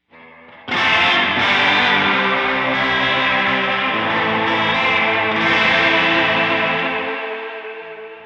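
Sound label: Spring Electric Guitar TENSION MOD LEVEL 100; LRG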